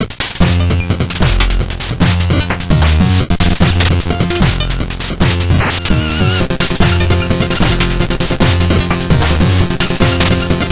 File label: Music Level Clockwork Tower; Shovel Knight King of Cards